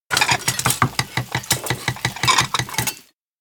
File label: cookware rattle; anemoi